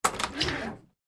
Door Open Hard Wood, Foley, Door, Open, Hard, Wood, Back, Door; BlastWave